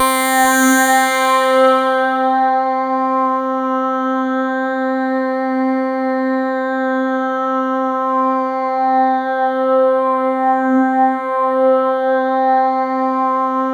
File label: C3, Roland JV 2080 TECHNO PAD